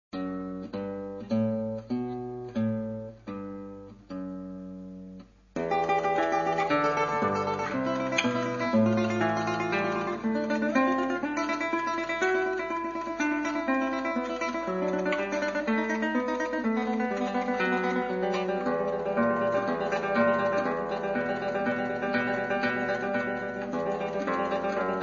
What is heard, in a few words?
etude 2 classical tremolo